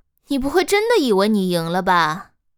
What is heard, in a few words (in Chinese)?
死亡, BattleCry; ycrpg